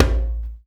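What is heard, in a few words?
HAND DRM, hand drum; Spectrasonics; Heart of Africa 1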